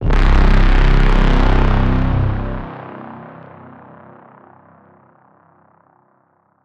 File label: Braaam Evil God C